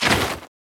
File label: rubbing clothes kick